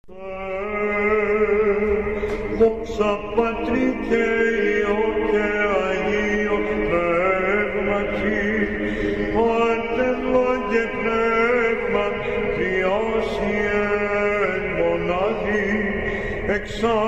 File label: Chanting